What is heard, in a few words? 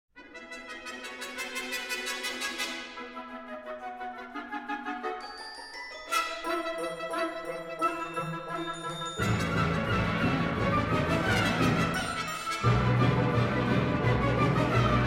Galop